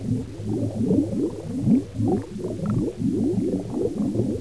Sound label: song; vchat; WORLDS; Fishbowl